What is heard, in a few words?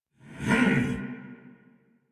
Attack Effort; Warframe; Dax Heavy; en